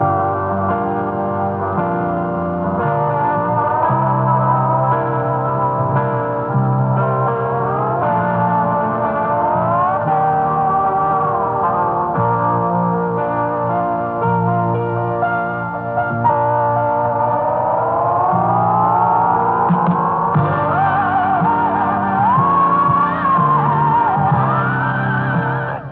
bass, Loops

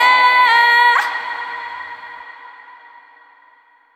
oh oh SUNG female, Voice; ooh ahh

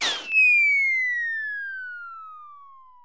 down whistle; Crash Bang Boom

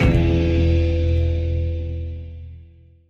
darkness guitars

